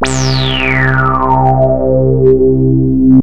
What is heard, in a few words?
bigrez, Kawai SX synths